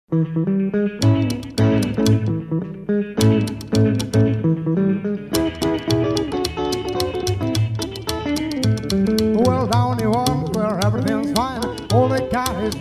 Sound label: Rockabilly Duet